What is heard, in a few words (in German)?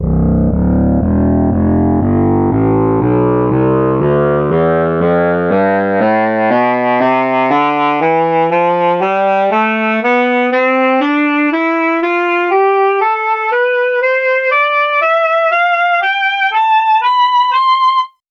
alto sax tonleiter